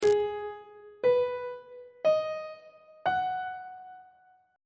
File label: f flat major 7th chord 3 is a flat minor 7th; on treble clef